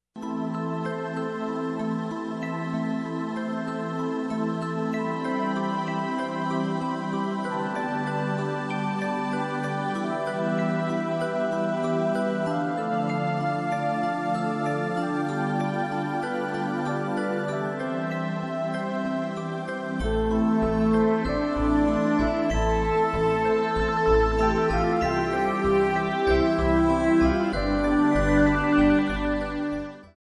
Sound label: Forest Glide, relaxing music; Album: Nature Music; spa music